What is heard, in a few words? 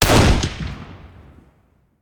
Rifle; Sound Ideas; Guns